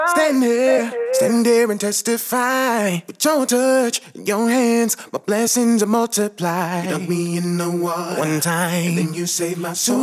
Sunday Morning (118bpm)

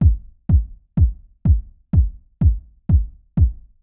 kicks

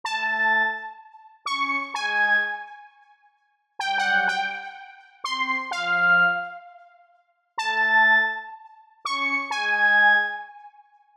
group brass

